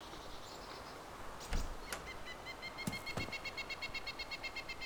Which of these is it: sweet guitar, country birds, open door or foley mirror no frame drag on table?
open door